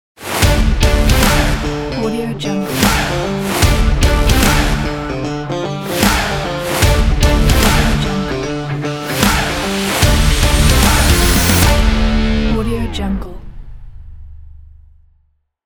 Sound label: rock opener ident